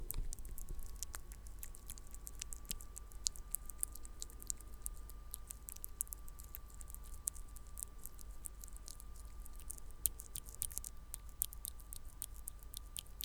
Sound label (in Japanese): クチュ音, 効果音